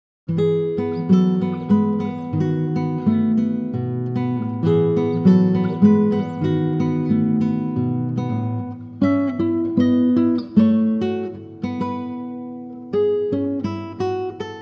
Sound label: classical guitar